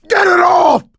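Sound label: scream while pounced, male; survivor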